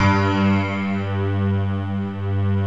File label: ANALOG STRING; OMI - Universe of Sounds